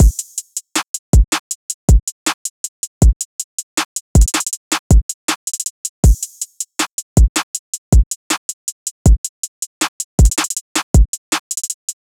Drum Loop 159 BPM; Runner; Cymatics; Mystery Pack Vol 4

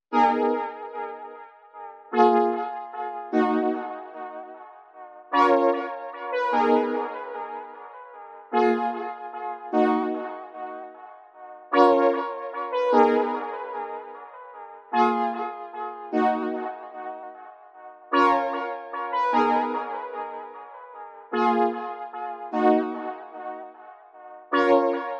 150 BPM D# Min Chords; Melody; Loop Stems; Cymatics; 2022 Melody Collection; Andromeda

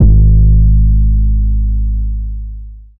808 - G#; Drums; One Shots; Humble 808 6; Cymatics - Humble Hip Hop Sample Pack

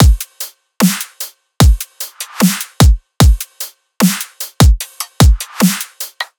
Drum Loop 150 Full; Loops; Ghosthack - Color Bass Essentials

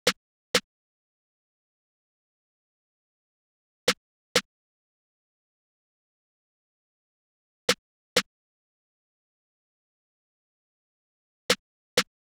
Drum Loop 126 BPM Snare, Hip Hop; Drum Loops; Loop Stems; Cymatics; Casa Drum Loop

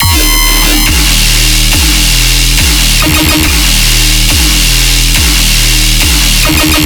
Bass Loop E 140BPM, Dubstep

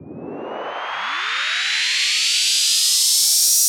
White Noise Upsweep - 130 BPM; FX

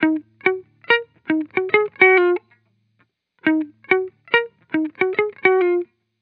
Hip Hop Guitar Loop 140 BPM D# Maj; Cymatics; Vibrations Guitar Sample Pack

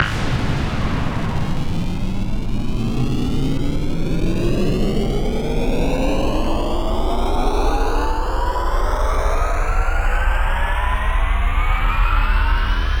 Booster Sounds FX; Video Game; Galaxy Rush; Cymatics; Video Game Sample Pack Vol 1

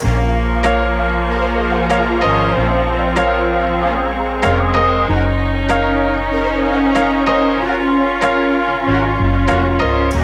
95 BPM A# Min; Cymatics; Zodiac III Beta Pack; Zodiac III Production Suite

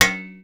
gong, Cartoon Film Effects

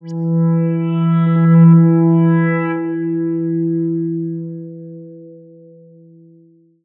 Riddim Melodic Hit E; One-Shots; Ghosthack; Riddim Essentials Volume 2

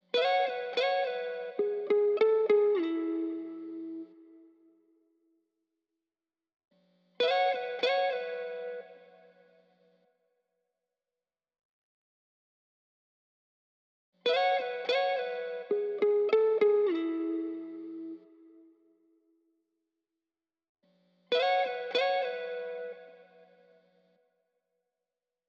68 BPM A Maj Accent, Guitar; Melodies; Loop Stems; Cymatics; GHOST (Beta Pack); Avenue

